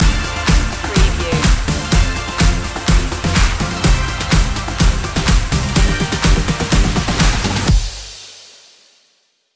house party stinger

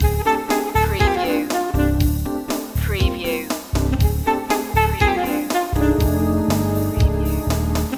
fusion time loop

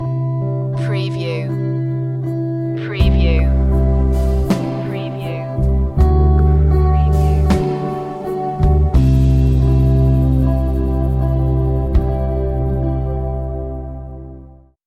delay drops stinger

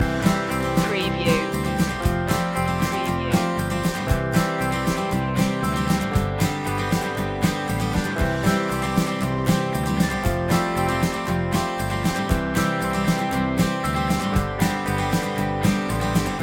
up and away loop

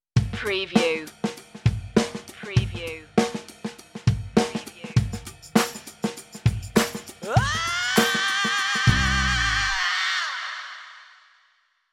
funkin yell stinger

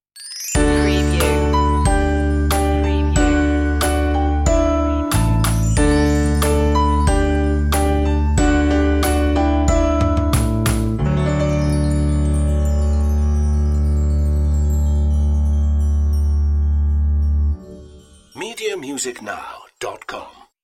twinkle twinkle little star stinger

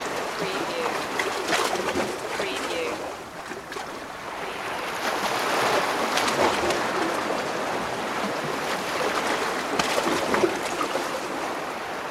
waves between rocks

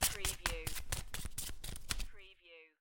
Playing Cards Shuffling Cards In Hand

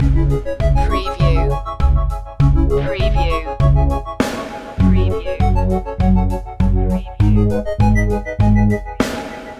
urban beat loop

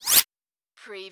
CARTOON ZIP

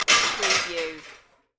Plate Stack

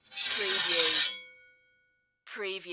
Sword Scrape Blade

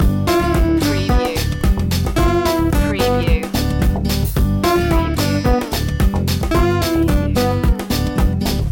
electro strum loop

